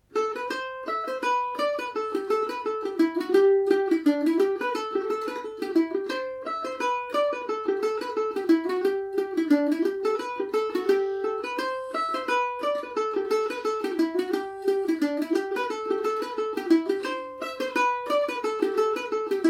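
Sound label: green fields of america G major, irish mandolin; at session speed on my Paris Swing Macaferri mandolin; Listen to me playing The Green Fields Of America at session speed on my Paris Swing Macaferri mandolin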